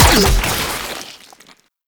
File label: Infested Shotgun Alt Fire Mech; Weapons